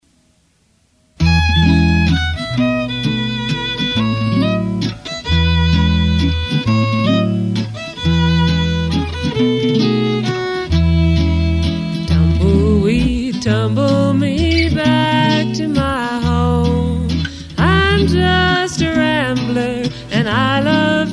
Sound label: Tumble Waltz